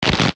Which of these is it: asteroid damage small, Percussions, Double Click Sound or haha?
asteroid damage small